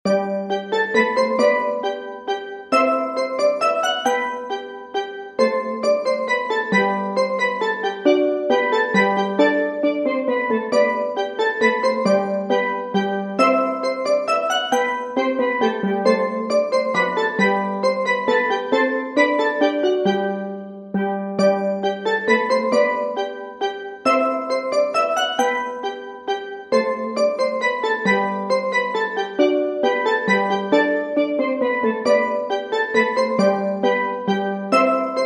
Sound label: Minuet In G; J.S. Bach